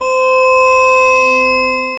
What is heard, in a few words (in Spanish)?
un sonido epico como con, gregorian